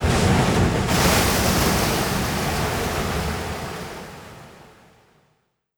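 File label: fishing; TSURI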